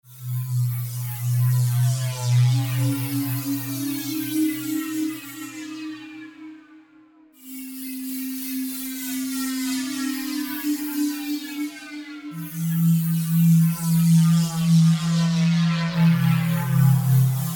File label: Tekno Beat